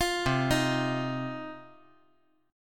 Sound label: C Minor Double Flat 5th C down 5G 4C 5E 5A